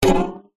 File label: cartoon impact strings slight ascend